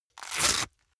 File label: boxing gloves open velcro fast; sport freizeit; boxen